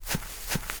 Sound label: walk; Mob